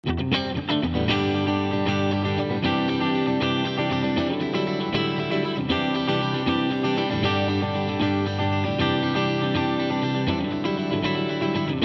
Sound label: Strum